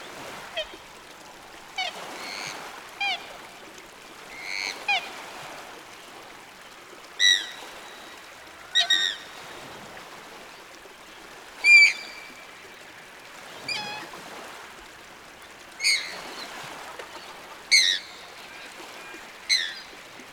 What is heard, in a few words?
ducks in lake; Golden Crayon Sounds